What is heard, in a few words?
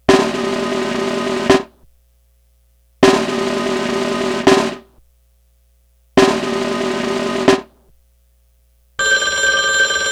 snare buzz roll telephone mark tree up